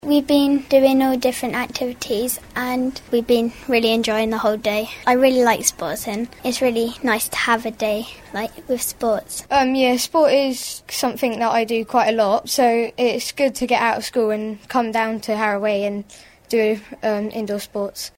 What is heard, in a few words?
kids; Harrow Way Community School; interviews; indoor athletics competition